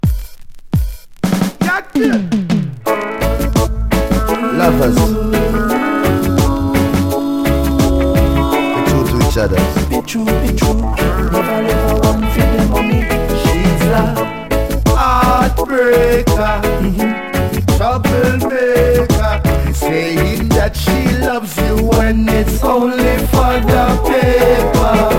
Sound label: bass riddim